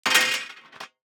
DICE on Metal, Throw and Roll, Standard, Four Dice; Samples; ArticulatedSounds; DICE Sfx Party (Extended)